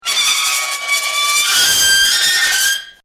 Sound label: Metal Screech Intense High Pitch; Nasty metal grind screech, ear splitting screeching and clanking.; Metal Sound Effects